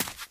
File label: dirt, footsteps, run